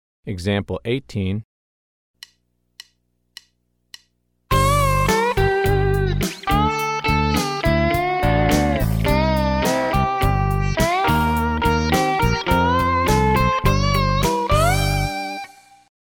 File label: Country Rock